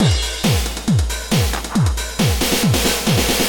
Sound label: drum